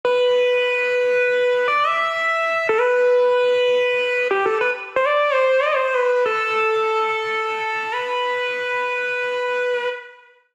Strange Leap E Minor 91 bpm; MIDI Clips; Tonal; Melodic; Ableton; Factory Packs; Chop and Swing

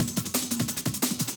175 D&B Drums; Dance DJ; Drum n Bass

